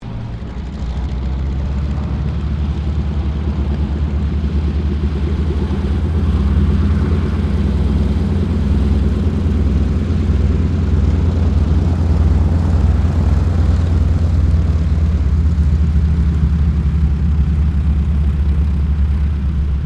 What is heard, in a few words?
AIRCRAFT T-28D TROJAN TAXI; Airplanes; Vehicles